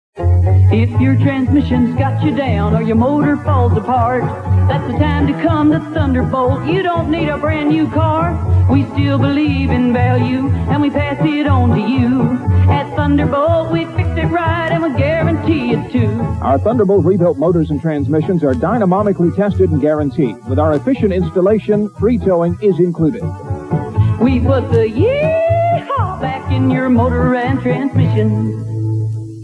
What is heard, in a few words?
Jingle Ringtone